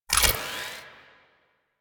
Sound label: Shotgun Quick Reload Layer; Warframe; Pax Duviricus